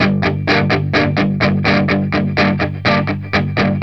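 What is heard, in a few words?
125, Vintage Blues Guitar rhythm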